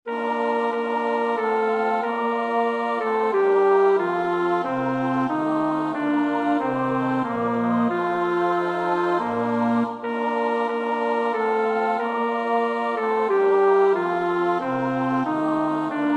bas; Bach (Johann Sebastiaan); BWV 057

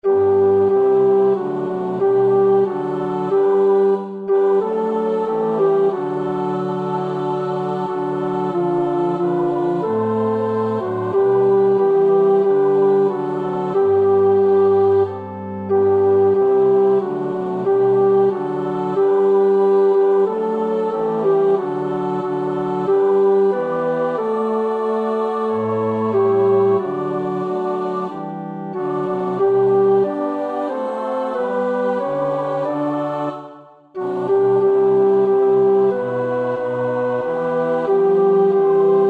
tenor, koorpartij oefening; Somervell (Arthur); The Passion of Christ

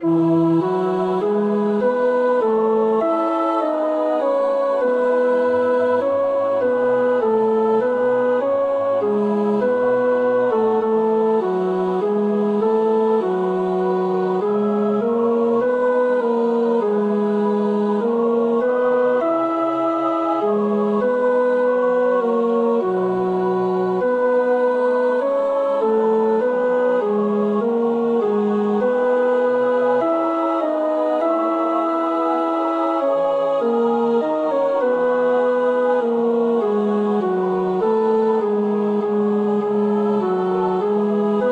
tenor

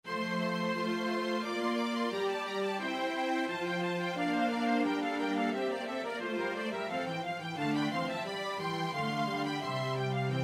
alt 2, koorpartij oefening; Schütz (Heinrich); Weihnachtshistorie SWV 435

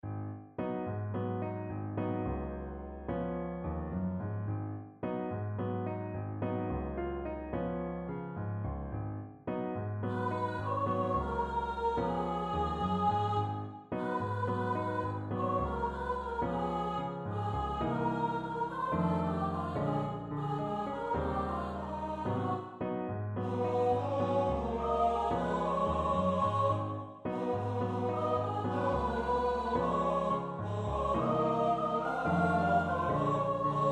S-A-T-B, koorpartij oefening

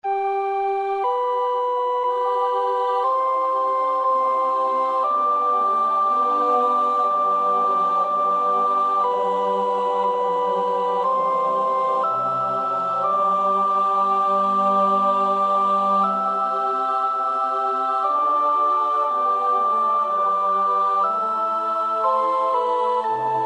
sopraan; Röntgen (Julius); 6 oude Nederlandsche liederen

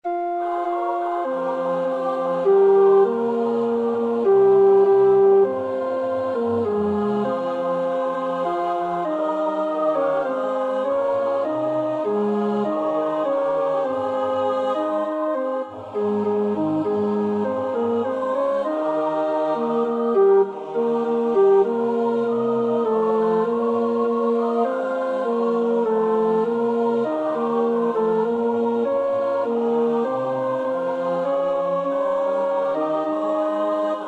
tenor, koorpartij oefening; Purcell (Henry)

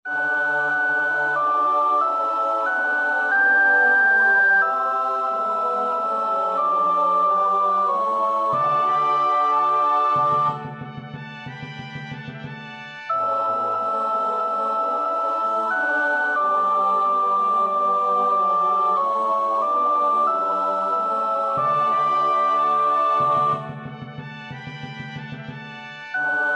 sopraan, koorpartij oefening; Bach (Johann Sebastiaan); BWV 171

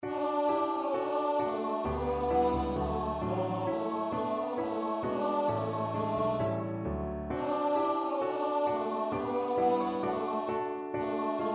S-A-T-B, koorpartij oefening; Brahms (Johannes); Zigeunerlieder; Wißt ihr, wann mein Kindchen

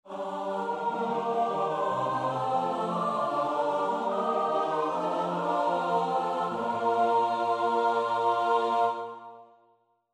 S-A-T-B, koorpartij oefening; Schütz (Heinrich); Matthäus Passion SWV 479

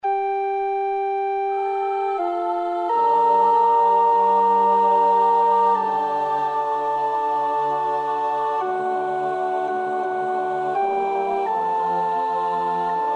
sopraan; Anerio (Felice); Christus factus est

